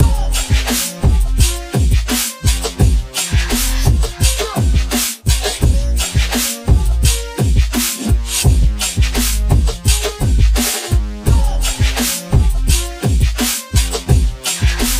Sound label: beat